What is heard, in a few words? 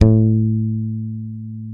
SADWSKY5MUTE, Bass; Sampleheads; Will Lee Bass Library